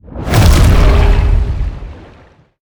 creature shadow leviathan exo attack exit

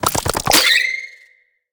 creature penguin skweak

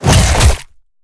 creature chelicerate bite

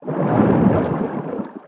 creature chelicerate swim fast

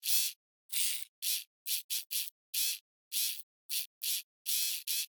tool hoverpad build arm right servo loop

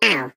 spy penguin vo hit wall

